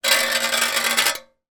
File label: household grater metal knife scrape on grid; zapsplat